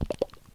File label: wiggle; World Of Goo